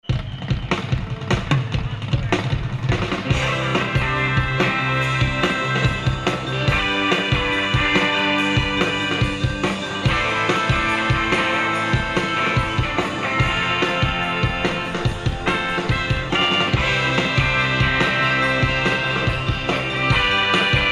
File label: Ring The Bells